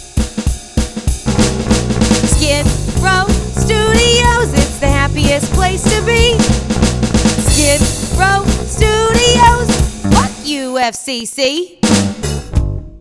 Jingle; Skidrow Studios

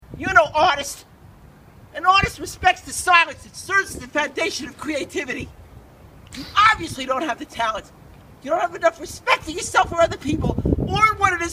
trumpet fight

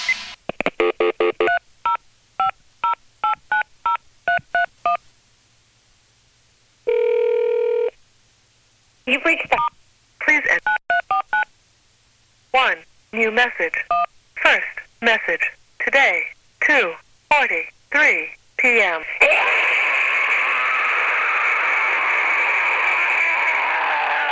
Machine Gun

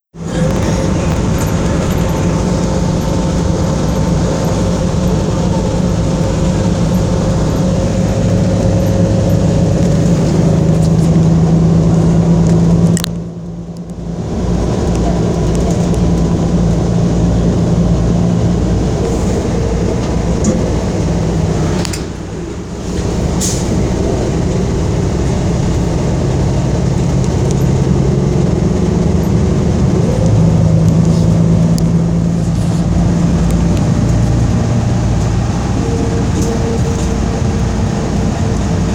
Onboard Quiet Bus Generic

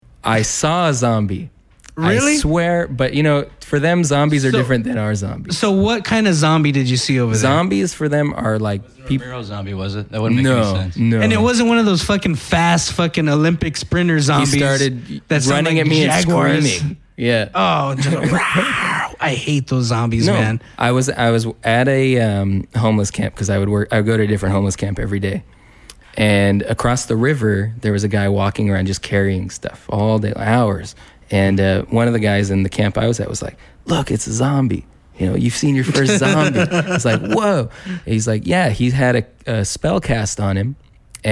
zombie; stab city

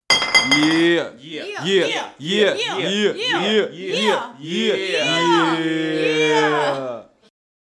Yeeeaahh

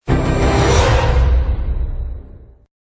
scary

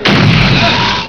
Boom

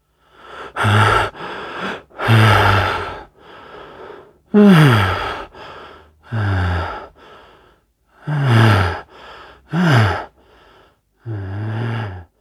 Orgasm

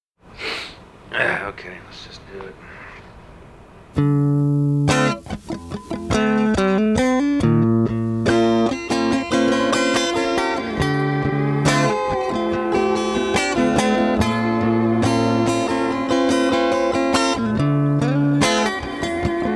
Jazz Instrumental

